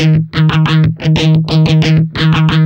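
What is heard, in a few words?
GUI L1 D#, guitar; Best Service Gigapack 2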